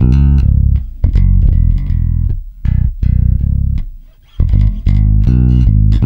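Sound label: shuffle C#, bass; Spectrasonics; Bass Legends; SHUFFIT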